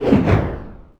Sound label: throw large swish